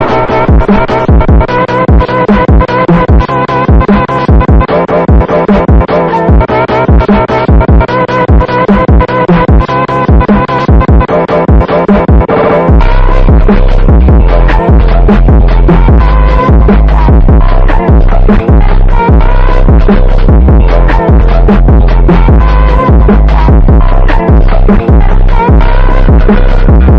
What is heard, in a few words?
phonk